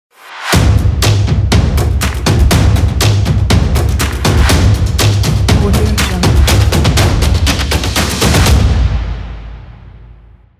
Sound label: stomp percussion logo